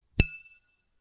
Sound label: gauge Pin One